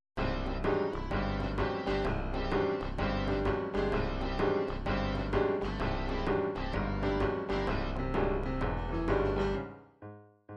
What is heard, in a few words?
rock n r two step